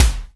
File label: BD, BASS DRUMS; EdgeSounds